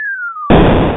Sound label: bomb explosion